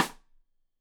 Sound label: Side Stick Stereo; Deeply Sampled Drums